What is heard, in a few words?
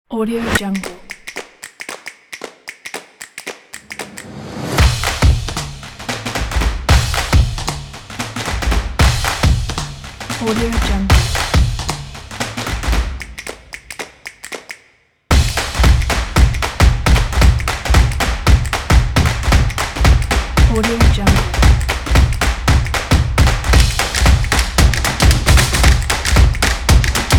fun; positive-sound